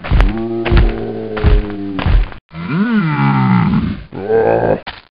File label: Giant